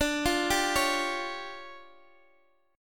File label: D Minor Major 7th Double Flat 5th, D, Guitar Open D